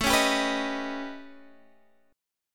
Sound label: A 9th Flat 5th, Guitar Open D; chord